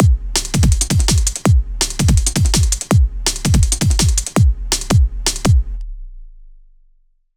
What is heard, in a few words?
breakbeat; fast music compo